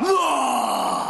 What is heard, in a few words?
ah struggle; ooh ahh